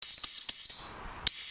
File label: dog